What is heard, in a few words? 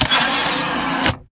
Mvmt Servo Damaged Head pivot Long; AI Stalker; Titanfall 2